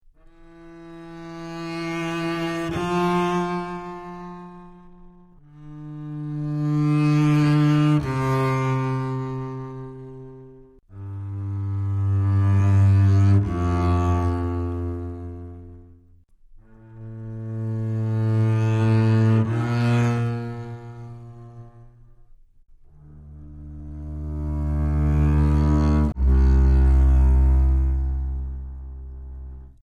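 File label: strings decrescendo, Sad; Loops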